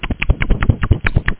chomp

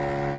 contra bass; Löwenzahn 7

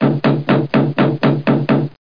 hammering; How Many Bugs in a Box

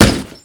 punch

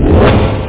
whoosh; roadhog

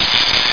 ELECTRIC; Duke Nukem 3D Kill-A-Ton Collection

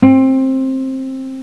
acoustic guitar, string instruments; Sound Effects for Multimedia - Volume 1

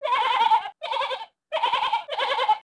LAMB, agri; zones

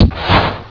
Fireball; BOOM

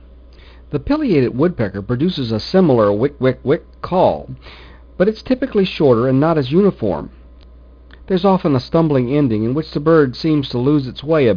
wood; NatureWare: North American Birds - Sight & Sound

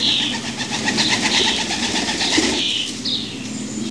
PARROT

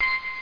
ding

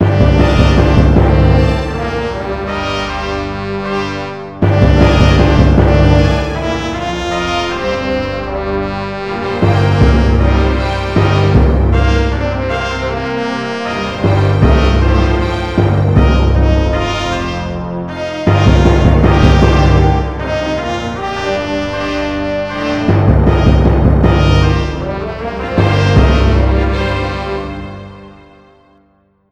lose

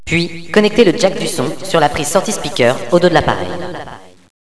kit; Villagina; ecole

